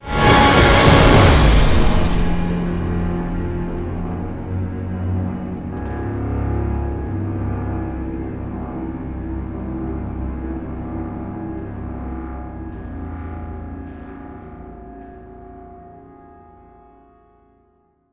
fight outro; transformers war for cybertron gamerip; A4 MEGATRON

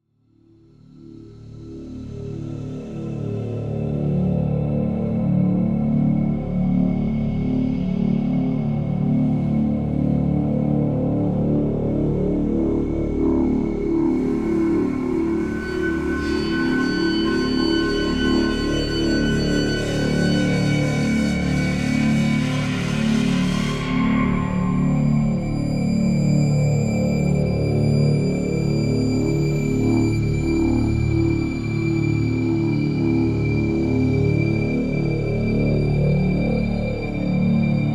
Sfx Eerie Places; Blood West

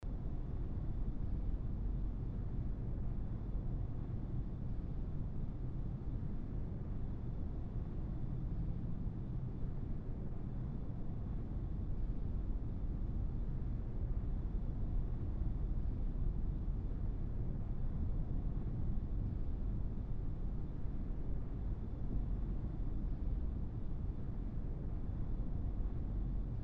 Gym Ceremony Ambience

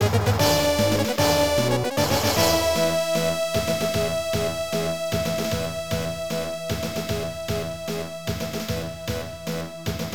Success; Growl; arcade; Clear #1

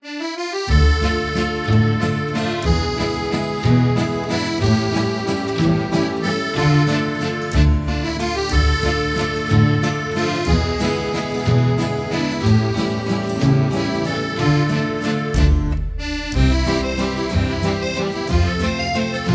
French musette; Jukebox

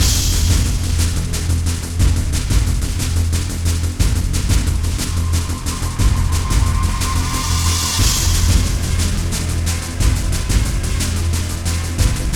Military Dogfight Loop; Chasing Dead; Wii U

